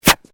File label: Fireworks Skyrocket Launch Distant Isolated, Domestic Fireworks